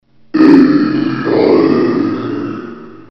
zombie; dod

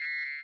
hit; tron

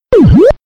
hit; freak fortress 2; pacman

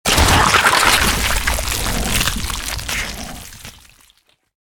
splatter; taunt packs; darkness

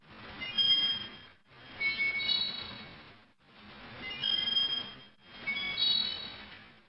waterwheel; dod

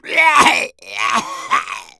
smoker lurk, zombie; Left 4 Fortress

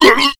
pain, slender shiver

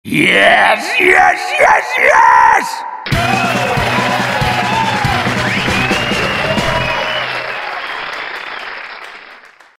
win music; tf; halloween mann brothers; blutarch

